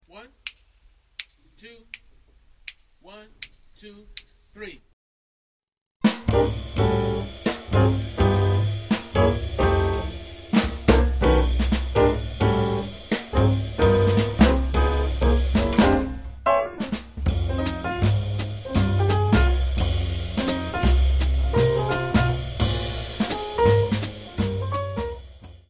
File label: minus melody